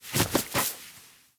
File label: Foley Idle Alert Ear Scratch Fast; Enemies; Kubrow; Warframe